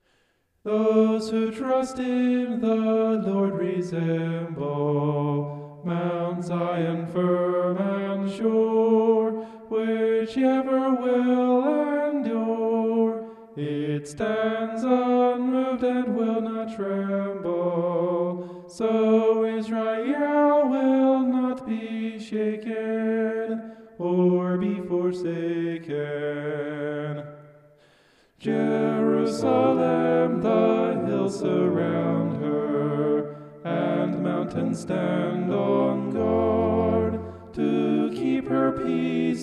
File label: vocal homoph; psalm 125